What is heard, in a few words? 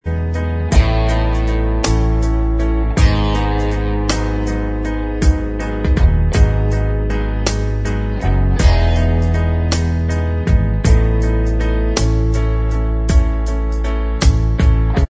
Inst Track